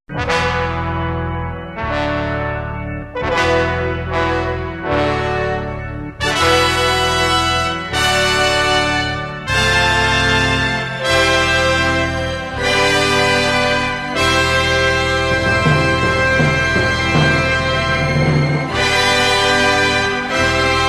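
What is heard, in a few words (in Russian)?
Торжественные; фанфары; Звук фанфар на начало праздника, на выход ведущих, или перед награждением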